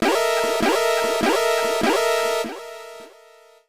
Warning; 1942

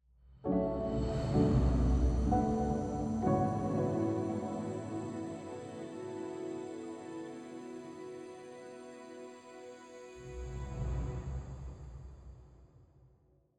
Forest Music, Harmony Grid Stinger

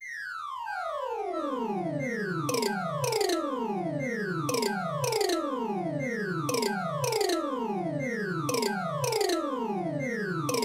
Ethnova

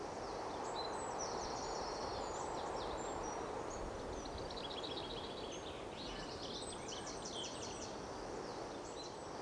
Amb Generic

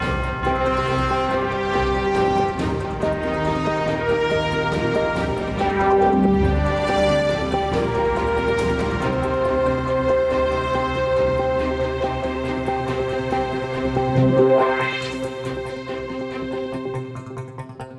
Player Defeat

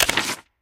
Vanityitem Unequipped

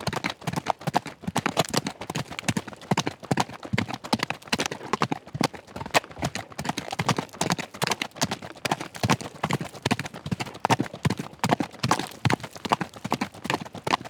horse galopp; teardown; ps5 windows xbox series xs

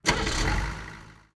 vehicle military ignition

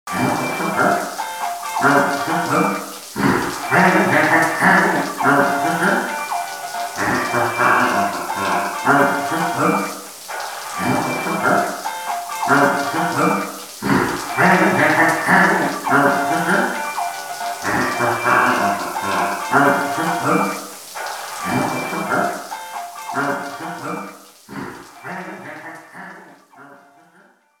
Shower; wheres my water soundtrack

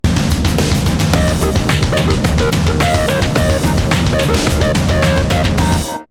Strike Clear; Energy Airforce Aim Strike; PS2

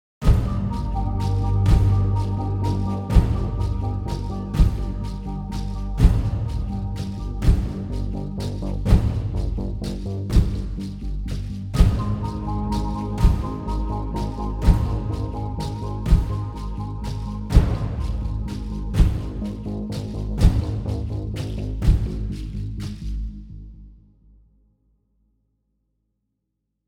Combat; Imperial Prison

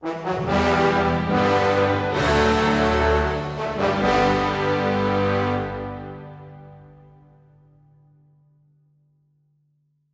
Level Up Stinger

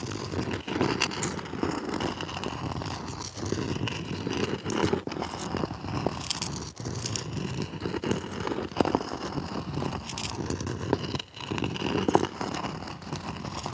masonry slide loop; teardown; ps5 windows xbox series xs

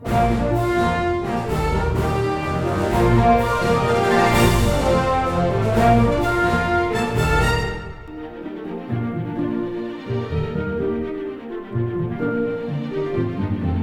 LOOP chase; DW How to Train Your Dragon

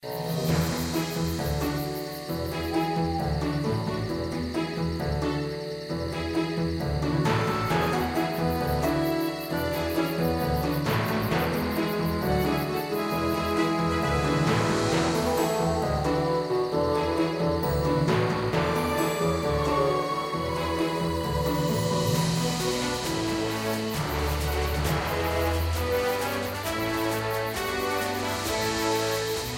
Epic Forest Theme Night; Trouserheart; Android

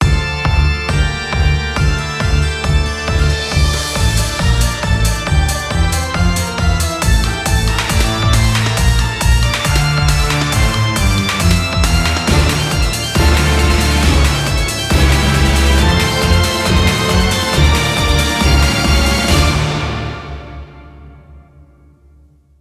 bgm continue; blazblue clone phantasma; 3ds

